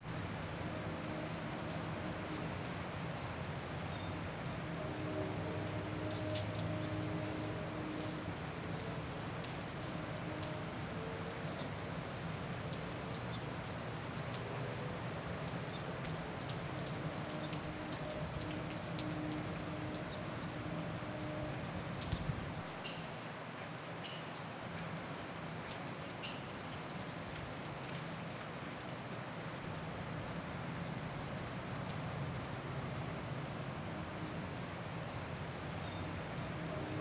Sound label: rain, Rain on Campus; montgomery college